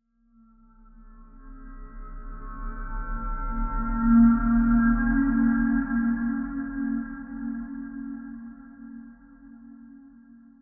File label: Spawn Hero; Blind Survivor